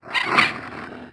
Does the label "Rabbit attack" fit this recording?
Yes